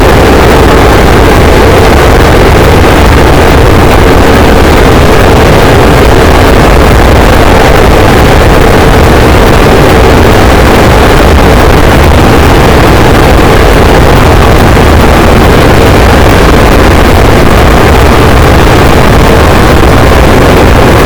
cachalot negatif, boat, Physeter Macrocephalus

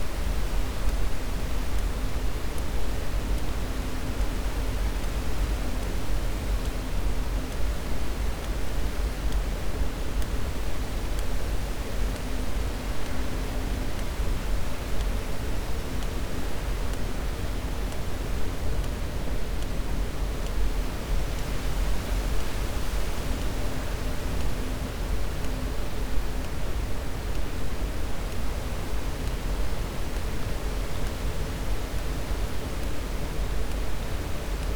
cachalot positif, Physeter Macrocephalus

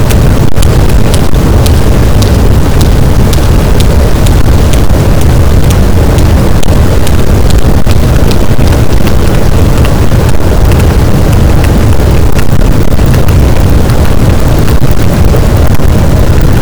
cachalot positif, boat, Physeter Macrocephalus

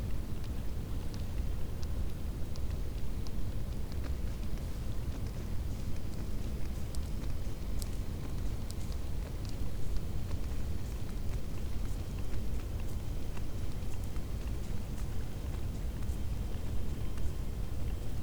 cachalot positif, Physeter Macrocephalus

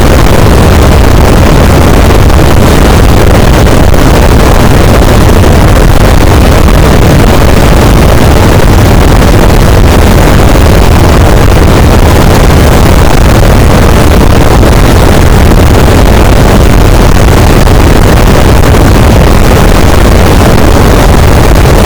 cachalot negatif, boat, Physeter Macrocephalus

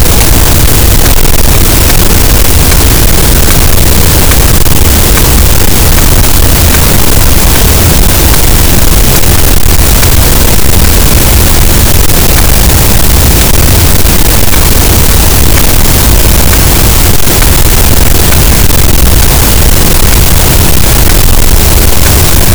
cachalot, Physeter Macrocephalus, gaussian noise

